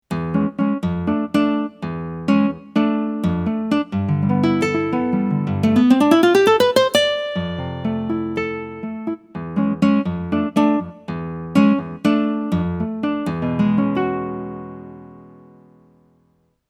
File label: nylon guitar